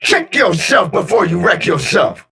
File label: Taking Damage